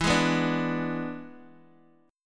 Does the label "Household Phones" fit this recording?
No